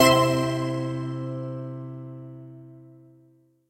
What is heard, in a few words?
intro Worlds; todee and todpee